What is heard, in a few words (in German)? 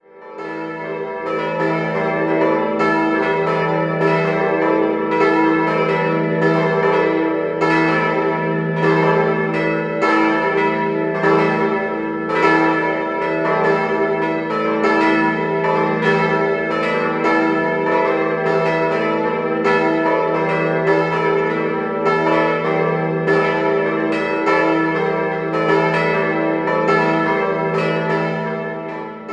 Sinning, glocken